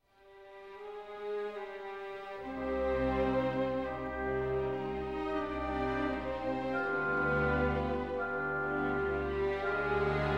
Sinfonia Concertante For Violin and Viola in E-Flat Major, Andante; Mozart; K. 364